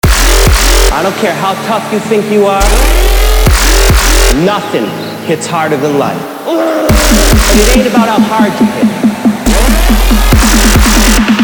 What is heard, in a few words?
Dubstep Remix